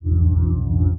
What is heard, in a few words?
Armed Ghost stand